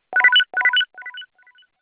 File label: Business Echo, Business Rings